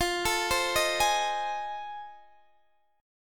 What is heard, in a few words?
F 7th Sharp 9th F Guitar Open D